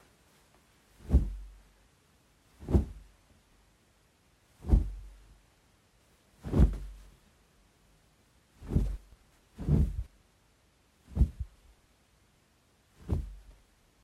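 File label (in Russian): звуковой эффект свуш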